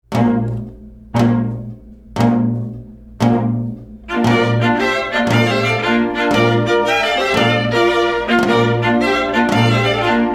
Nostalgia Tarantella

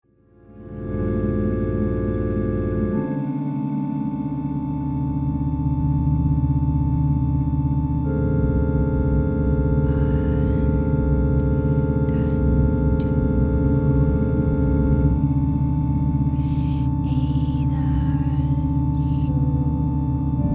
organ

